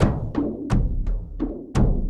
Kendang Down; Sonic Foundry (Sony Creative Software) - Friendly Fire; Drums; Frame

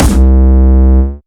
Distortion Kicks, Bassdrums

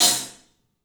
club hat; 3D Sound; 3D Drums

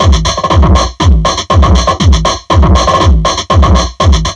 Drum Loops Crazy Processed

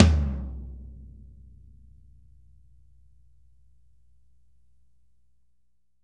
Drums CLOSE; Big Fish Audio; Ross Garfield Drums VOL-2; SHILOH KIT

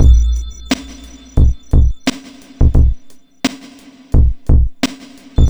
BEAT, Hip Hop

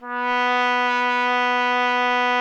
BRS TRUMPETS, Roland LA

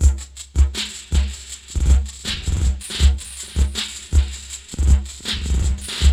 DRUM LOOPS; Zero G; Malice In Wonderland

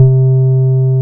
BAS MKS-50; Best Service Gigapack 2

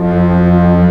Organ, Big Barton Pipes

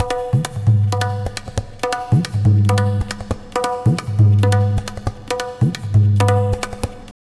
Tabla, 135 BPM; Zero-G; Pure Tabla

